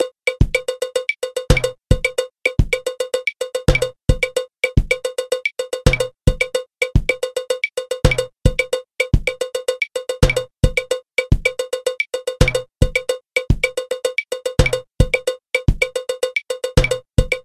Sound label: timba timbales